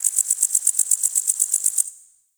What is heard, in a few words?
Cricket; Warframe; The New War; Ambience; Zariman